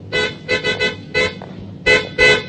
clacson

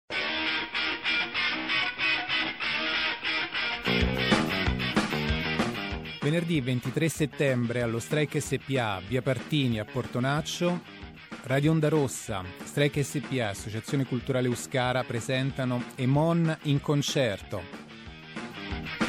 concerto; no rancore

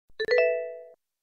suono mail

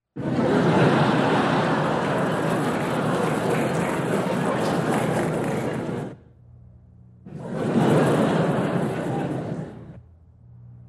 Sottofondo pubblico risate applausi

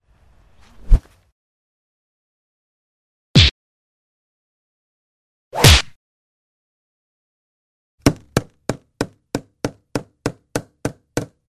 Cazzotto - Punch - Whoosh

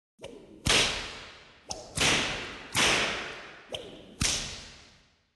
frustate; Suonerie

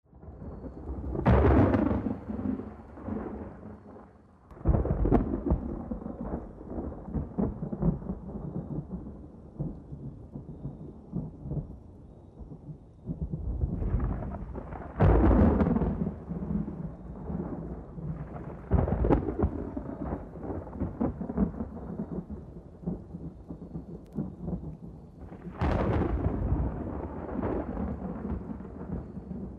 Tuono E Temporale

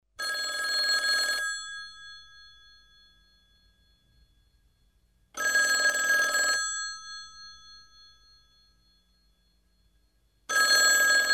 Squillo Telefono Vecchio

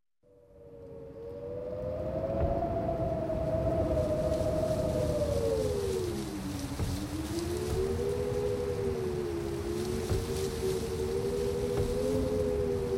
Vento Con Rumore Delle Foglie

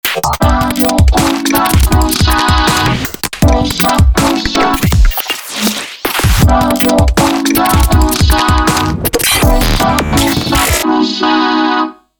jingle spot hyperpop breve

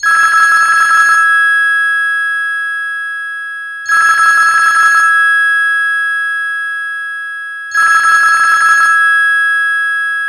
Telefono Antico

